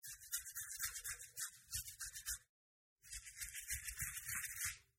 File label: Scratch Head, Man Scratches His Head With Full Hair-2 Takes; Miscellaneous Human Sound Effects